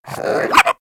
Puppy Idle Hiccup; Vocals; Warframe; Kubrow

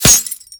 Helmet Impact; Grn Chem Nox

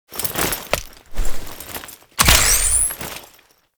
Pickup; Town Escape; Sirocco; Warframe; Duviri

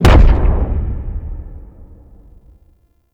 Far Step Default; Warframe; Corpus; Arachnoid Hunger; Steps

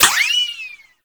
ricochet; Warframe; Cowgirl

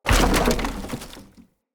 Wood Boarded Wall Small Destroy

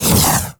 Flame Laser Stop; Warframe; Corpus; Corpus Railjack; Spec Ops Fire Moa

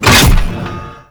Thumper Bot Jump; Warframe; Grineer; Movement; Steps

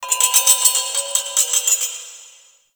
Sparks; Warframe; The New War; Zariman

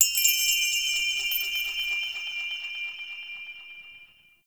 Interior Orb Station Chime; Warframe; Tau; Orbital Station

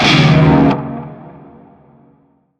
Shawzin Yo Chord D; Narmer; Warframe

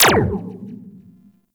Crewman Laser; Warframe; Weapons; Corpus

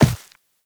Walk Dirt, Footsteps; Operator

